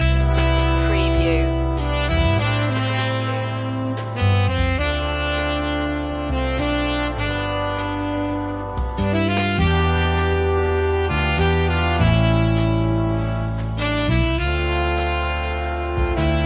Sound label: big band romance loop